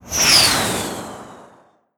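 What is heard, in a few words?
military missile range rocket; empire game settlement select; missle; whistle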